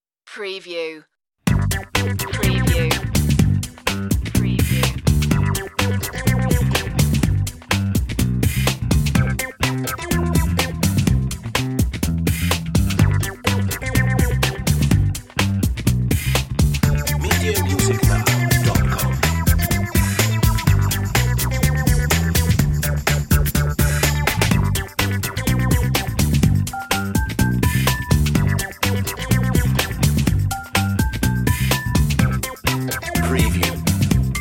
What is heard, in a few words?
no guitar